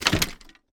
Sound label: Wood debris Shuffle